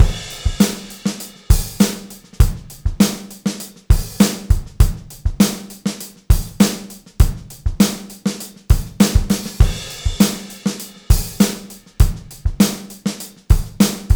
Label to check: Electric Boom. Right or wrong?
Wrong